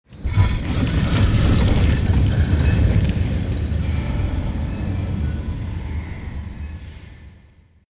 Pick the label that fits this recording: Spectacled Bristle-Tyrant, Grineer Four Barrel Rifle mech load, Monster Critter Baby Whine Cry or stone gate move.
stone gate move